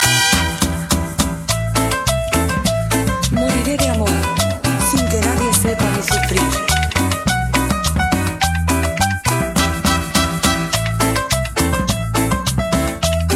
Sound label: cumbia